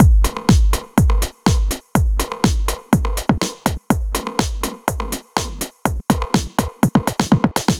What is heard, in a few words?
Drums, 123bpm, Nu Dance Electro; Combi Loops; Zero-G; Soundclash; Acidized Wavs; The Arches